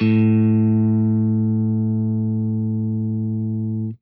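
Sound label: jazz line, guitar; Quantum Leap - Guitar & Bass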